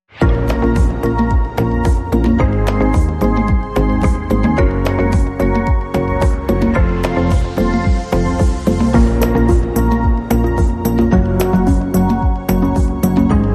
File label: Tropic Summer Vibes